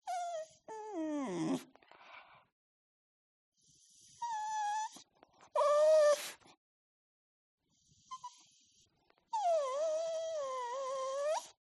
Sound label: DOG Whimper Sad Nerved; ANIMAL; smartsound